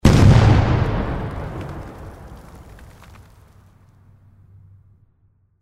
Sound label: explosion; Dynamite Digger Eureka